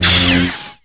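Light Saber ricochet, Contacts avec d'autres sabres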